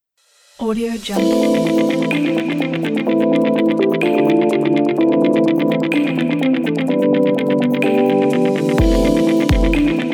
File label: Innovative Technology Corporate